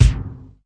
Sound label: harmonic kick; Kicks; single hits